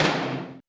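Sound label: Tom Lo Aggressive, Alesis D4 drum machine; 300 Drum Machines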